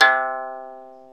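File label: ethnic plucks; Eth Plucks, Pluck Duo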